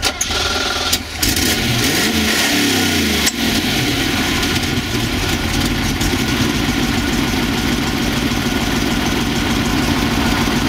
startup, vehicles; jalpa

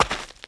gravel, footsteps; gmod

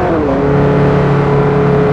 fourth cruise, vehicles; gmod; tdmcars; spark

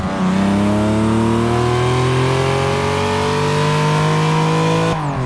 second, vehicles; gmod; tdmcars; pon fiero gt

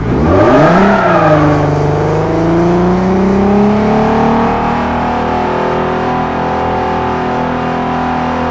rev, vehicles; c32

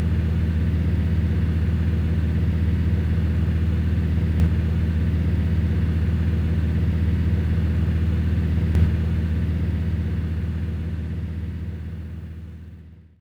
v8 idle loop

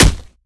melee character fist; viper

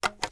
reload empty shells in, sh; gmod; wfoly plr sh charlie725

